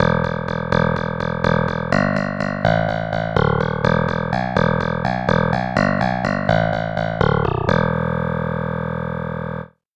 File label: clavinet bass; SpectMorph Evaluation #4